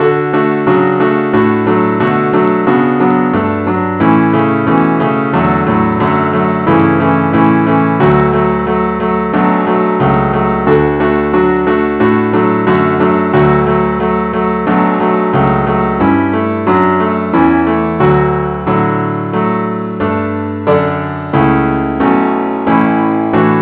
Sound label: keyboard only key d